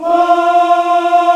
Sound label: VOX African Ahs, African Aahs